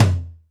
Tight Floor Tom

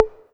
M-Audio Venom Mute High Conga

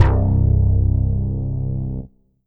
Korg M3R Bass C1; Meet Mr Bass

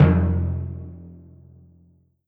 Korg N1R Kettle Drum

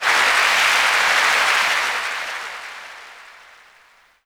Yamaha TG100 Applause

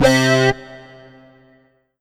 Korg N1R Split Sync C3

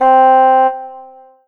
Roland SC-88 Bassoon C4

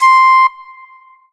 Korg TR Rack Piccolo C6

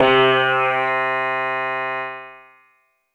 Yamaha CS2x Sitar C4

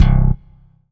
Slap C1; Korg N1R; Slap It

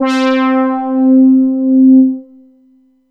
Yamaha EX5 Ober Brass C4

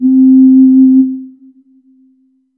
Kawai K5000W Whistle C4

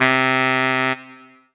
Roland SC-88 Baritone Sax C3

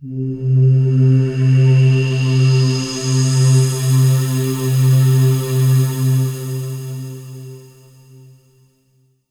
Korg TR Rack Breath of Life C3

